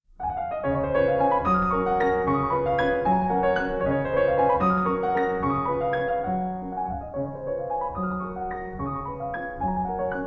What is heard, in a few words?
Waltzes; Chopin; No70-1; Lympany